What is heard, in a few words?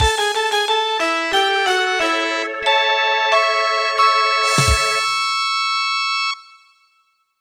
Win; House3